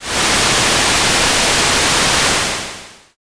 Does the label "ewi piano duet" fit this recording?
No